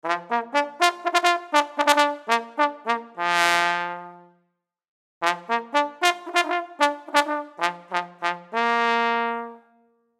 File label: Trombone Charge, Trombone Charge Horse Race; Brass; Musical; Blastwave